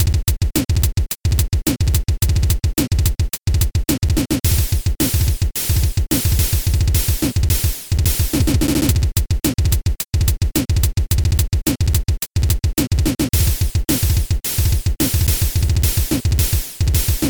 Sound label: make drums; SYNTHESIZING MY OWN DRUM SET